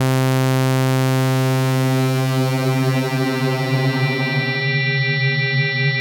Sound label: morph to metal